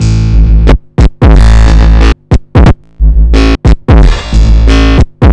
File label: noise lips 090bpm; Zero-G; Downbeat Dance Grooves